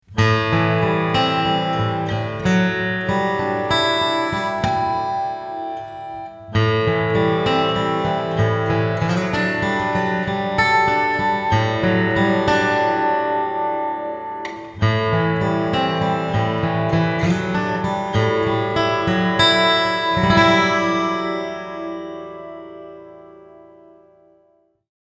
Room

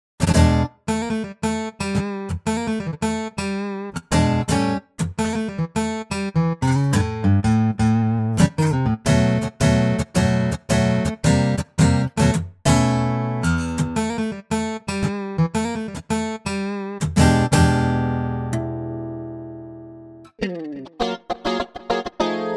SuperNatural Acoustic

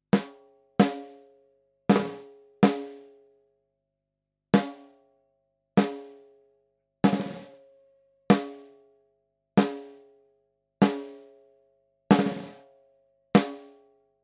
goldmike mk2 sm 57 snare

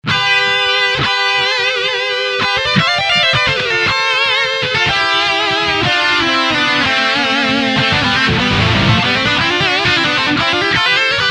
Twin Harmonic

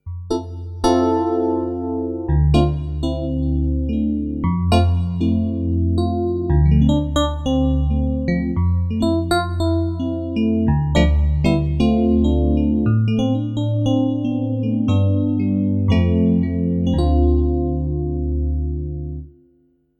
FM Piano

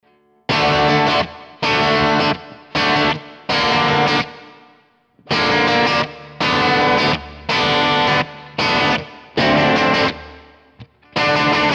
Danelectro 59M NOS Crunch Bridge Pickup DADGAD

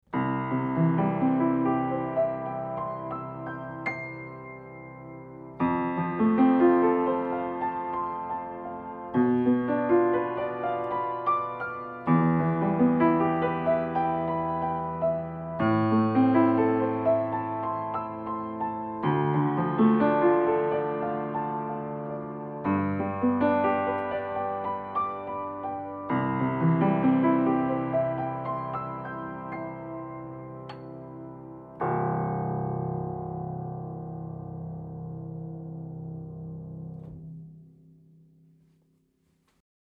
Piano MS Side Signal Dual Mono

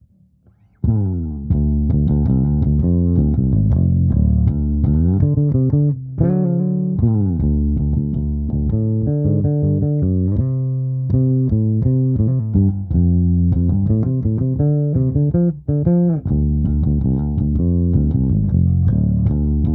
Flying V no horn

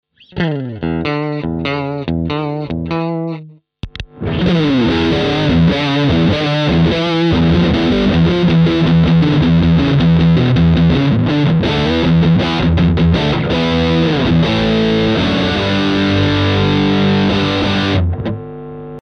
BM20 ULTRA Gain max Some Riffs

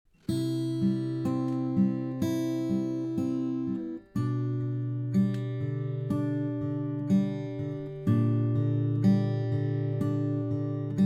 Takamine FT340 BS Mic Picking

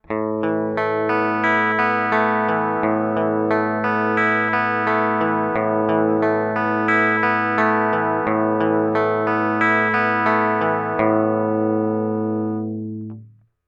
Mooer Tone Capturer guitar Target Guitar Real

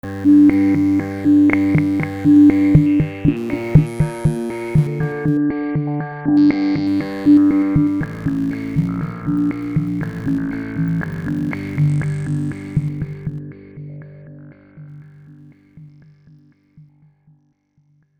Perc Sequenz Moog Modular

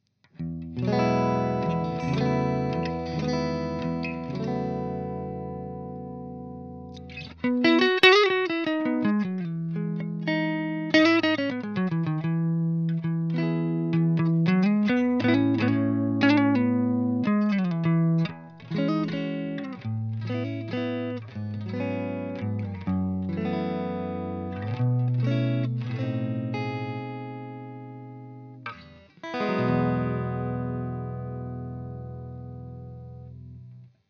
Strat

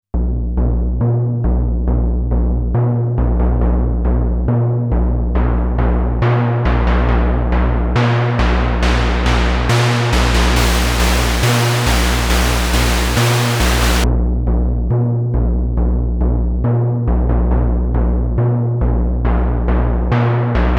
TritonAudio D2O Mono Synth DI Fet

